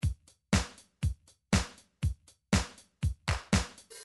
midi drums, euro

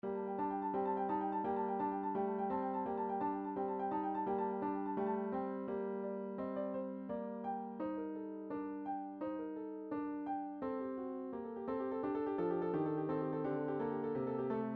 classical, midi

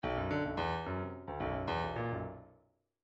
bdf midi file grooves funk q funk q

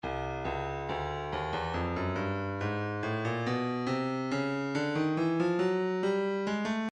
breakbeat 070 bpm; Intimate Moment C; Stylus Breakbeat Midi Files

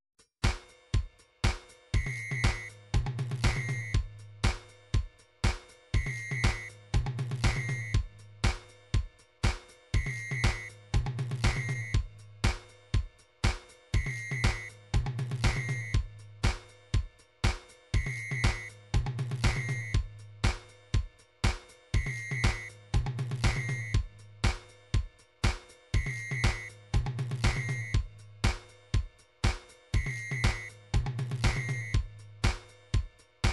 drums, midi drums

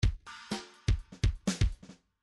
midi grooves funk

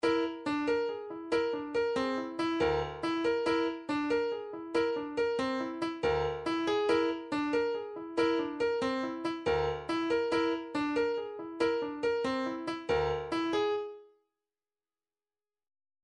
slow rock 70 bpm; stratosphere

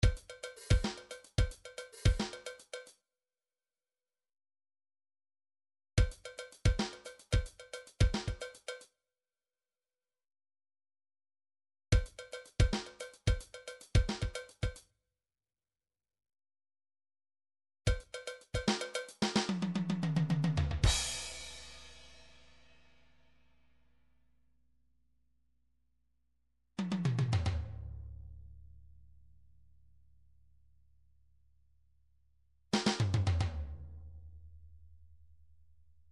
drums, midi; Bruford Midi Drums

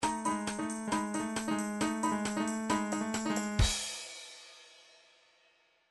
trance midi loops fills sfx; Newtronic Trance Constuction Kit

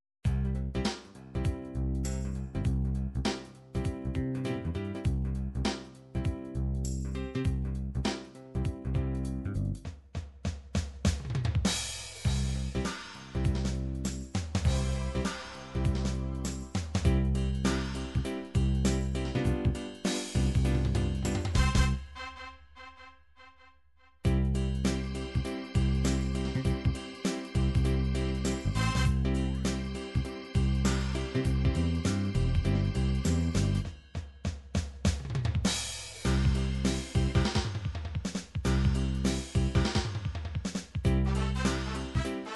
16 beat, midi

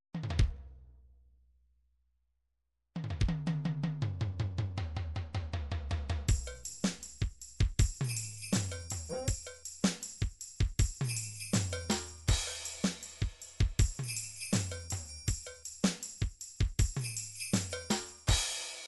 drums, midi drums

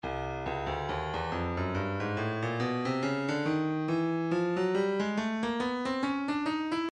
breakbeat 070 bpm; Stylus Breakbeat Midi Files 070 074 Bpm; Lowdown C

